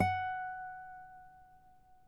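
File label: nylon, FLAMENCO, Guitars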